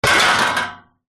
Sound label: metal frame bed loose hit rattle; impacts